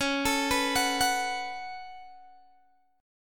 C sharp 7th Suspended 4th Sharp 5th C sharp, Guitar Open G